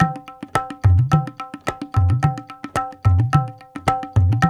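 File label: 108 bpm, Qaw Keharwa, tabla grooves; Tabla Grvs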